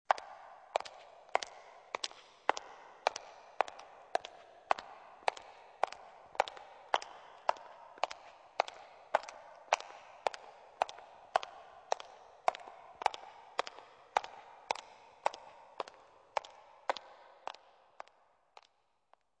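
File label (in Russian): элегантные высокие каблуки с пустой реверберацией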